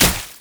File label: manta strike; LoL; fizz